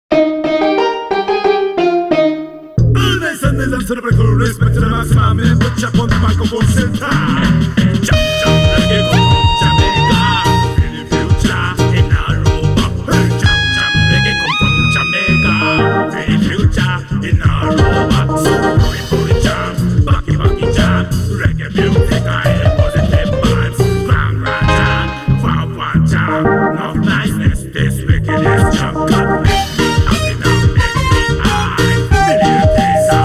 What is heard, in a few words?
Jam Jam Reggae; DDR 2nd Mix Append -Club v2